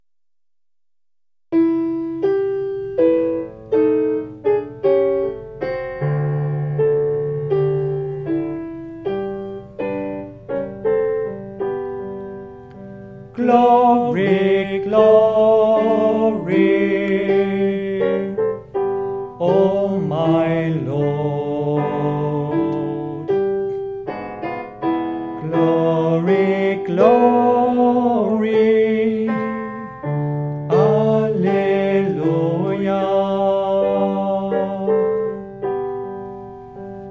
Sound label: spiritual lent